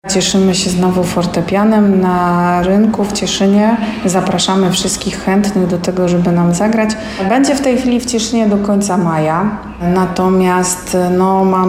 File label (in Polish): powrot fortepian